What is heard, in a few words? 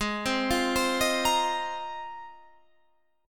G sharp 6th Add 9th G sharp Guitar Standard; arpeggiated; JGuitar